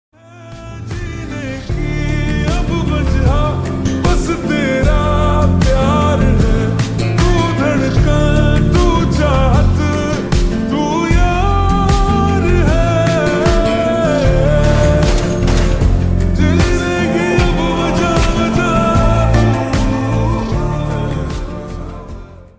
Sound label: Slowed Reverb Ringtone